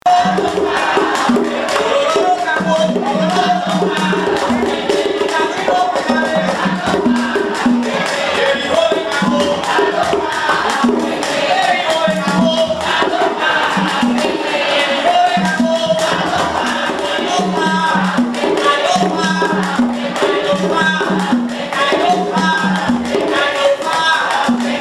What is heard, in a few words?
rumba